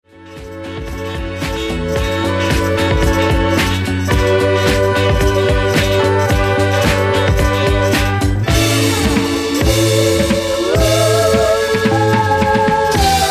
Nice Slow (Instrumental)

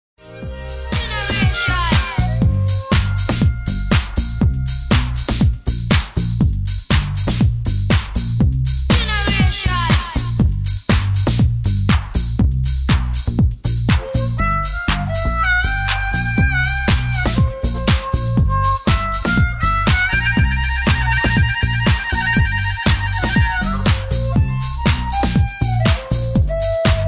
Harmonica Track

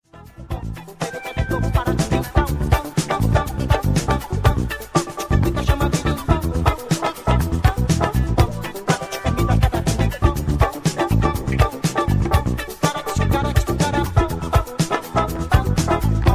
Toque De Cuica; brasil

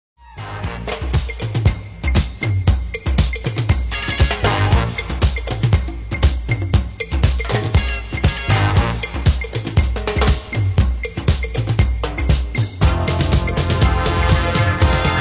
DRUM

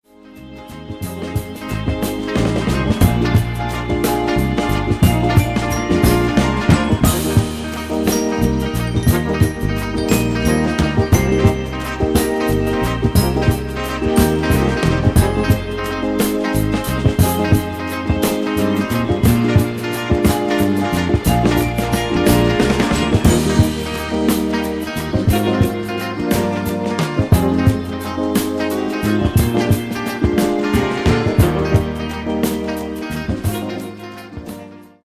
Instrumental

